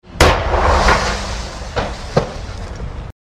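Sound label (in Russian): взрыв петарды в микроволновке; Звук петард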